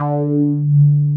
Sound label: DIGALO D4, DIGALOGBS